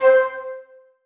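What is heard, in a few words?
flutes staccato c4; Sonatina Symphonic Orchestra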